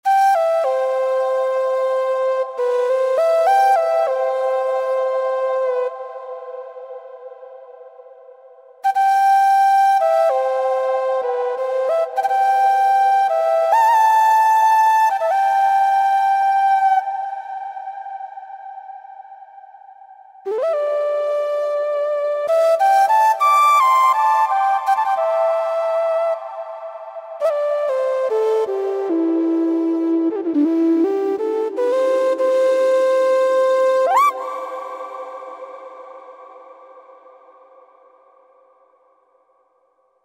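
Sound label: Breathy Flute; Roland V-Synth; Factory Patches; 434 Breathy Flte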